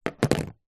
leisure toy fidget spinner metal down on hollow wood table; zapsplat